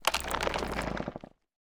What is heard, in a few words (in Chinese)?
嘎枝待机声